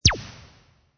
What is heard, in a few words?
Lazer gun sound effect, single shot.; War